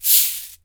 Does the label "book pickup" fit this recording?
No